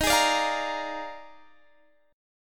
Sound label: Eb Major 7th Suspended 2nd Suspended 4th, Guitar Open G; x,20,18,19,18,20; down